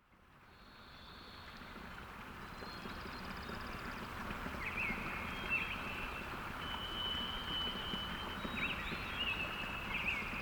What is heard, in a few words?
Cascades Watersong Birds and Brooks